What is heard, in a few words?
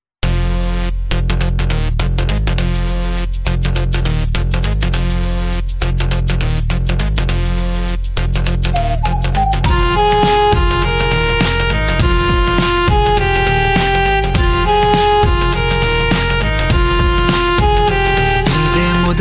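background music ringtone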